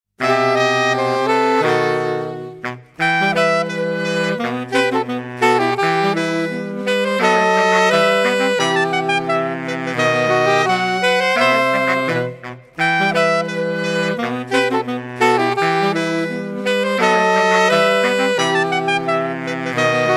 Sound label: instrumental; banque de comptines